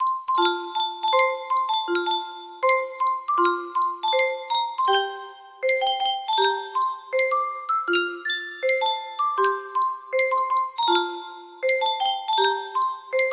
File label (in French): yunsheng boite a musique, music box melody